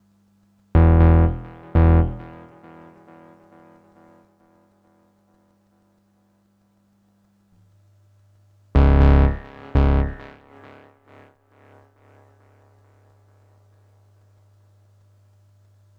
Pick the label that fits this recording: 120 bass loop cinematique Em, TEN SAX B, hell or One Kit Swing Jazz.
120 bass loop cinematique Em